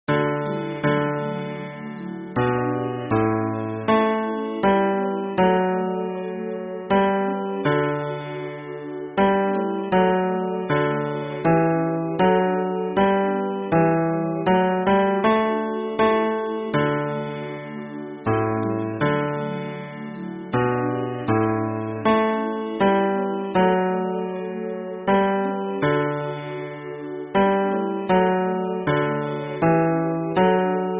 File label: hymn bass; 末日聖徒イエス・キリスト教会